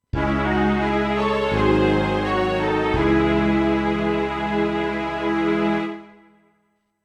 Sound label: SC880; dune 2 the building of a dynasty